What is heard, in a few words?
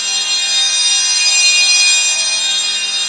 D#3, TURBOBELLS; Northstar - The Wizard VOL-1